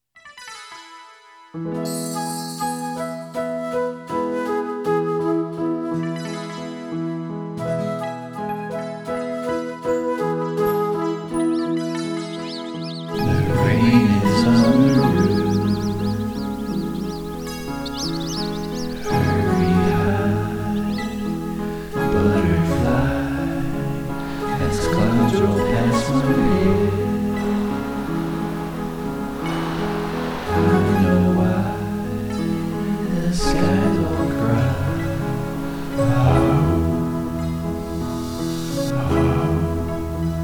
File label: sing sound effects